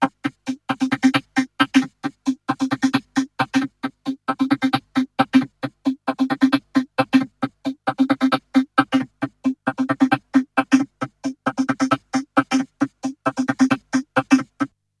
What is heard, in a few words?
VOCO LOOP; ELEC-LOOPS; Syntec; Wall of Sounds; TECHNO-BOX